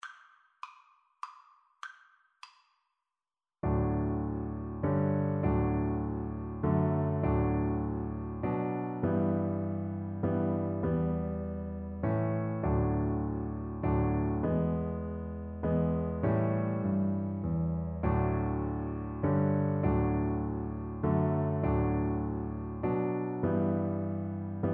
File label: Cello